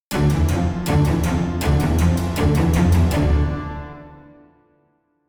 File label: Combat Out; The Witcher 3 Wild Hunt; Novigrad